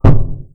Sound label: tap FWUMP